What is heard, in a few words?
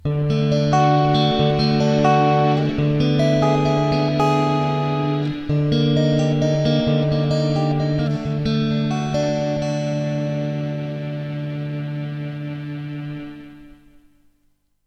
Strings plus clean Strat